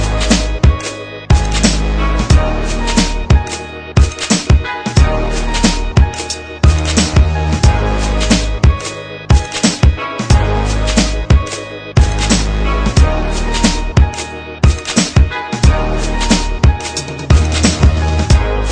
Hard Hip Hop Beat

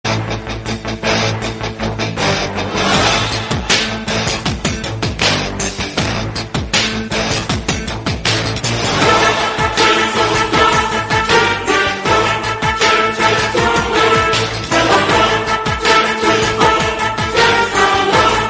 Background Music

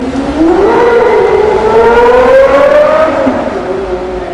Pagani Zonda Noise

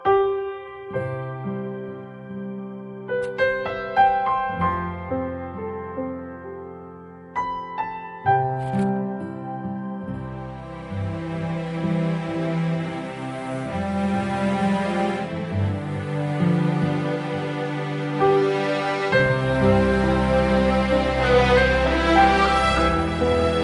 Soft Tone

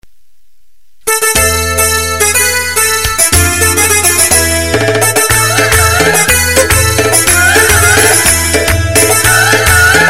Farsi Music

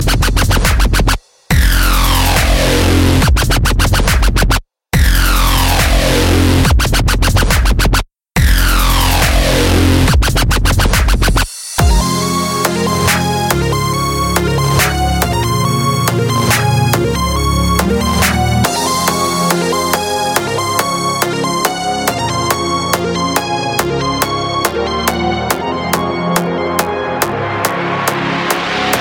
Dubstep; Dubstep Call Marckcj

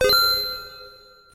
Money Sound

